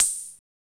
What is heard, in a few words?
CR-78, analog; Sound & Vision - Gigapack I